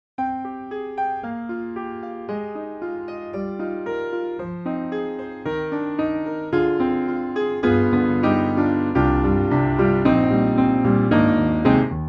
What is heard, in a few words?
Neumann KM133s; Soniccouture; The Hammersmith Professional Edition